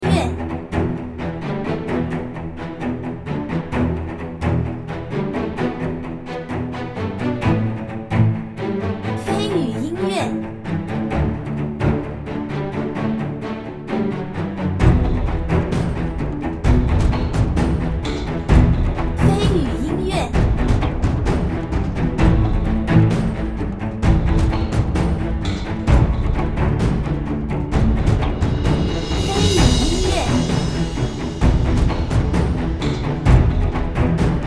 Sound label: PVP tongyong